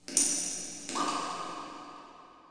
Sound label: PIPES, pipes; PC Gamer IT CD 40; zones